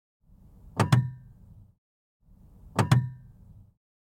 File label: hv contactors